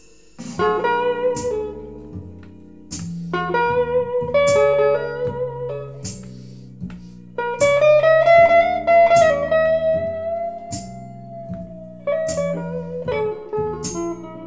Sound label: Tuesday Blues